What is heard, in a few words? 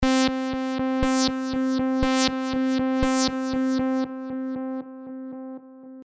delay mix